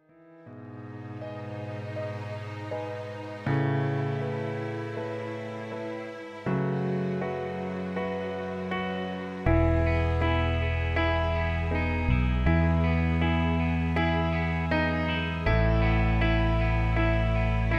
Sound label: Instrumental